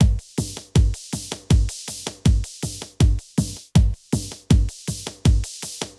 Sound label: DRUM LOOP ambient 160